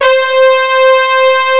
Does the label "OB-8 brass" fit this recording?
Yes